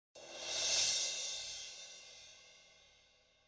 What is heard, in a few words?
Cymbal